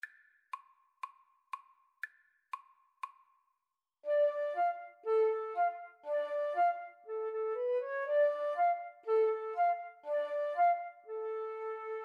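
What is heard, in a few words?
Bulgarish 2 flute karaoke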